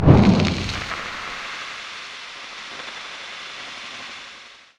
searing chains; weapons; dota; ember spirit

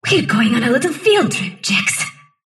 sylph move pain; dota; dark willow

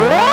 powerup; spoonman; dota; crownfall

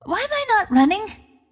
attack pain; Dota 2; Windrunner

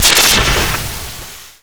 viper strike

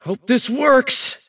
cast pain; davion; dota

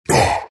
morph pain; terrorblade

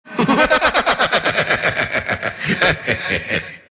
laugh; dota; oracle

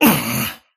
parry fail; dota; kez

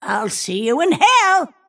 death; snapfire

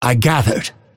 move pain; dota; phantom assassin

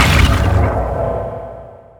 stonegaze stun; weapons; dota; medusa

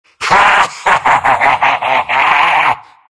big laugh; dota; undying

